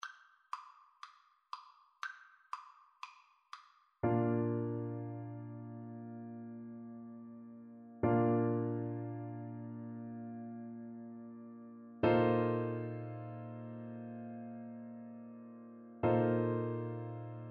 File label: dvorak largo, Trumpet